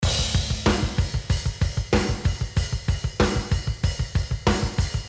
Drum

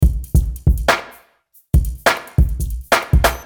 Drum Acoustic Space

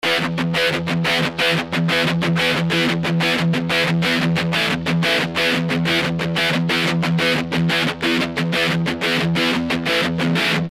Stereo El Guitar After Heavy

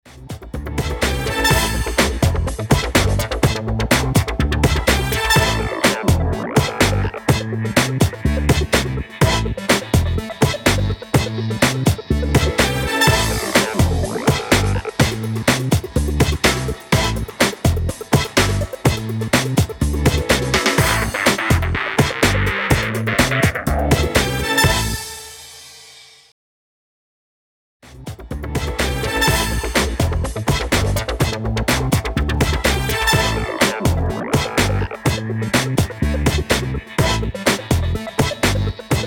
Electronic Music; Spirit Of 76 Slammed

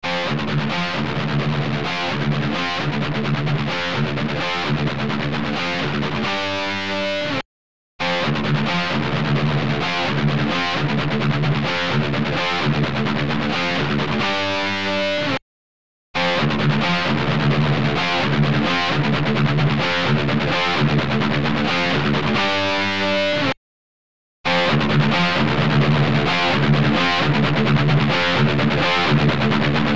Guitar

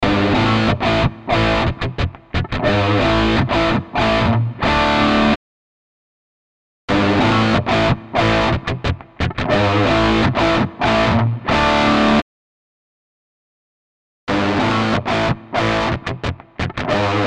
E Guitar

